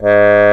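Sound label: brass, Trombone; Roland L-CDX-03 Disk 2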